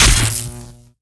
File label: Plasmagun Metal, Damage